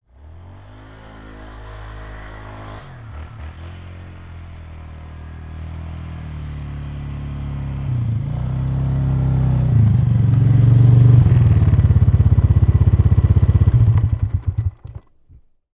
ATV Approach, Sports, Atv, Approach, Shut, Off, Polaris, 500Cc, Four, Wheeler; Blast Wave